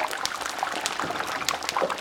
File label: Existe Loop